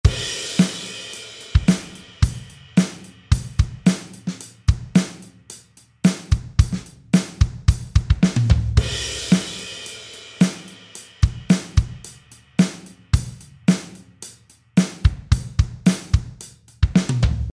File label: Kick Drums; کیک درام